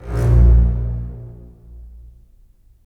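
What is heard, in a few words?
STR, strings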